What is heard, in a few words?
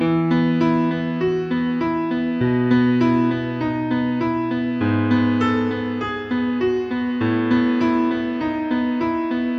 e major beautiful classical piano romance theme part two 100 bpm; LOOPS